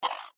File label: ostrich die